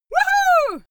female cheer woo

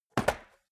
metal box small close; maschinen; soundpool

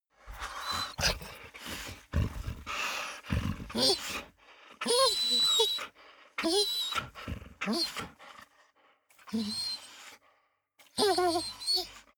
dog squeak grunt; natur-tiere; hunde

